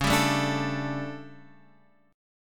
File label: D Major 7th Suspended 4th, chord